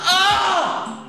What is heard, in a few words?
ahhhh